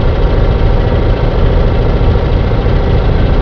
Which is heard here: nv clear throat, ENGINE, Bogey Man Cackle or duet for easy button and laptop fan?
ENGINE